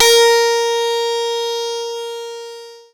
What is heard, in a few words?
PLUCKED, Synclav FM, A#; Big Fish Audio